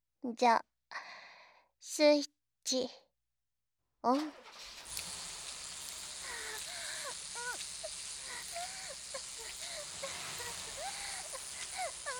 レトロバイヴ責め＆耳舐め; デイドリームＴＳ機械姦～どうしてもメス化したいあなたがＡＩのエネルギーになるまで

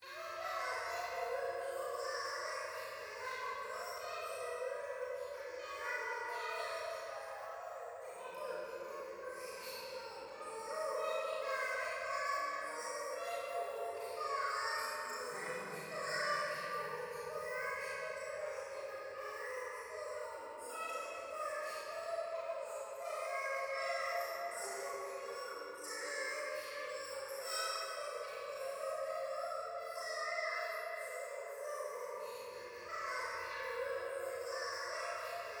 廃墟 可愛い喘ぎ

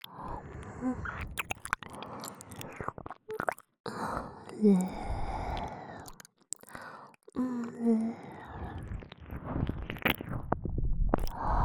右耳舐め

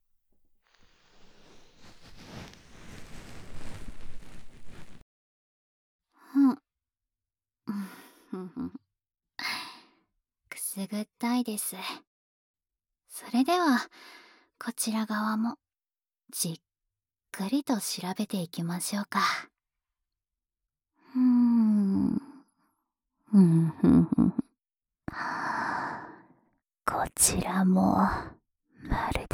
左耳かきパート, ASMR

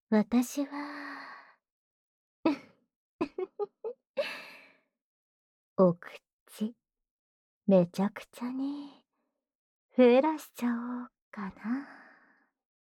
妖艶 喉奥口フェラ; フェラ素材

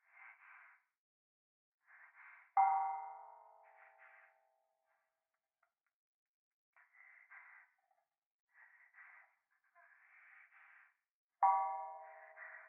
風呂 左耳密着吐息 寺

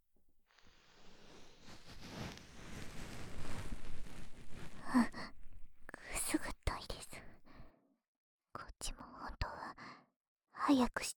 【左】高速耳かき, ASMR

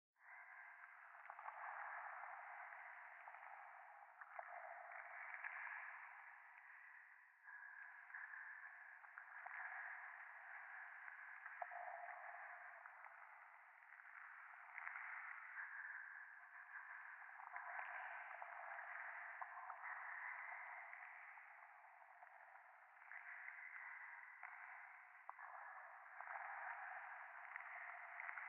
ゴースト 右耳快感吐息 触手

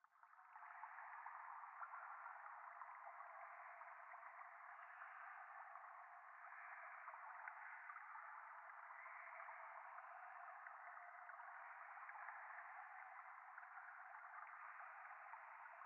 ゴースト 右耳密着吐息 川のせせらぎ

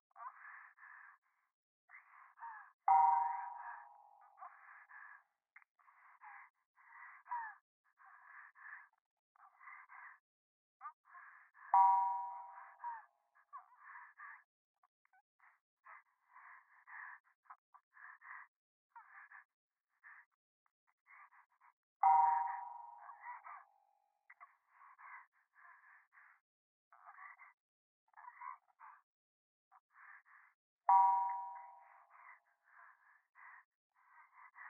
電話 右耳密着処女喘ぎ 寺

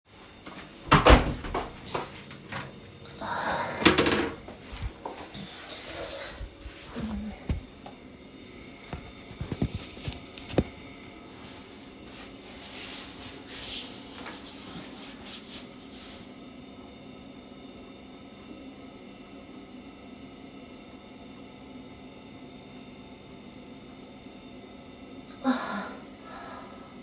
居酒屋 排泄音

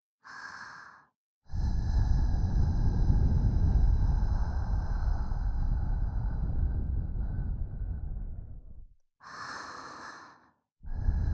【右】耳ふー, ASMR

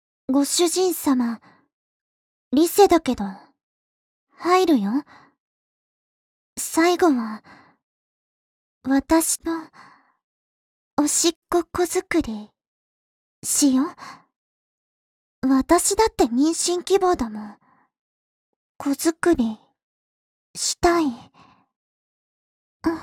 ごっくんSE

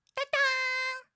Windowsのスタートアップ

